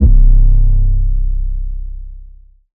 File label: 808 C; Advent Calendar - Day 9 - 808s